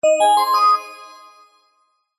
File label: multimedia alert notification bright organ bell like musical positive